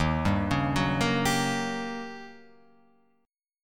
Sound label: Eb 7th Sharp 9th, Eb, Guitar, Open F; Eb7#9 arpeggiated; 3,2,1,1,1,2; JGuitar